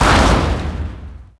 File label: Garage Door Closes; Max Payne